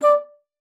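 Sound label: D3, bass flute; Xsample VOL-2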